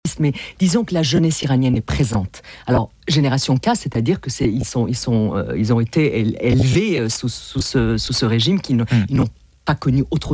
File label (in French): Radio Classique